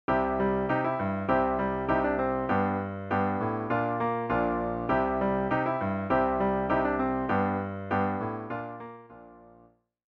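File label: vamp, piano rendition of the chords, C G Bb; song intro; 3-Chord Vamps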